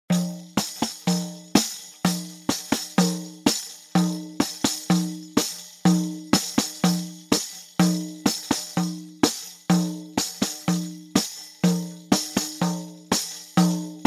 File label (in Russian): ritm udary po kozhe i zvon